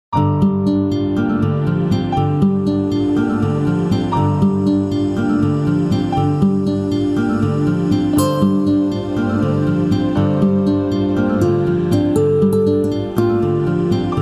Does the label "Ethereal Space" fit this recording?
Yes